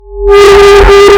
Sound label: hyper sonic blast; meme